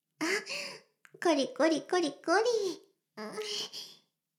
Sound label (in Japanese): シコシコ音声; 魔法少女マスカリマッスル